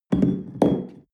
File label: espresso upset tablecloth soft